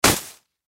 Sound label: Snowball Impact Hit; Ice; Weather; Ice Sound Effects; Blastwave